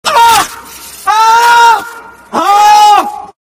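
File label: pain critical death; voice packs; scout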